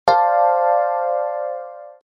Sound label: sms and mail